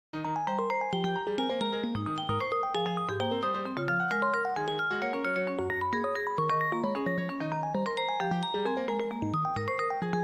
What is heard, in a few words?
harmony example